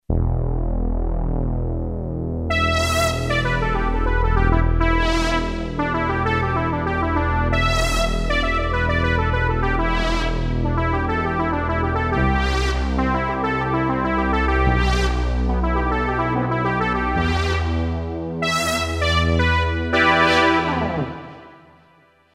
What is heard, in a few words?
SFT BRASS; Akai SG01v